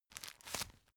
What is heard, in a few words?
tissue take